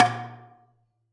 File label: Gambang Hard Beater, Gamelan